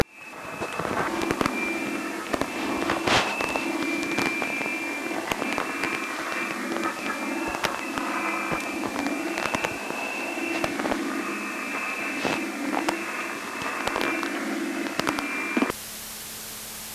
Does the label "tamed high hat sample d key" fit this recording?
No